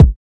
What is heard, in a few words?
2000s subby steel kick drum a key; modern kick drum